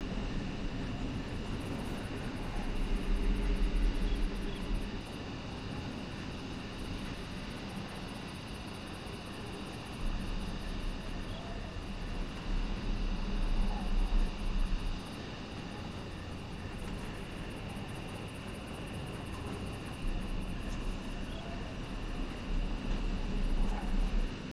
黑暗精灵森林fx, 环境音效

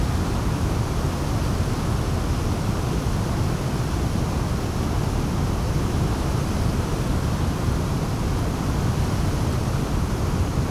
水龙卷音效; 2021西路背景音效

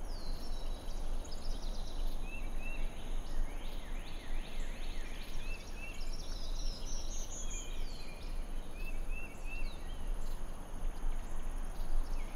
曙光森林音效; 2021西路背景音效

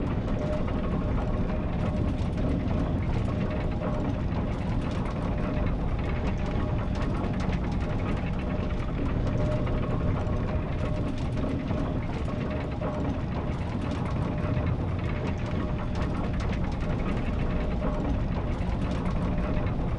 机械副本fx, 环境音效

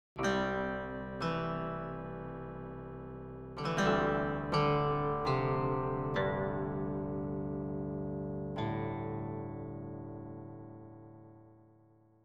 瑶琴独奏

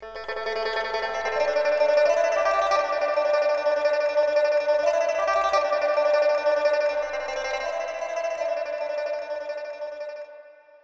琵琶独奏

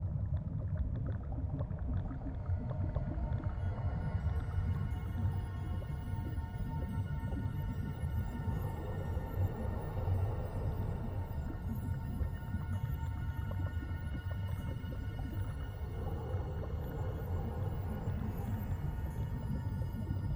人鱼宫殿音效; 2021西路背景音效

